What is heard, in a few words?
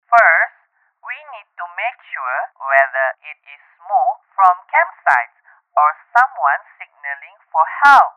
phone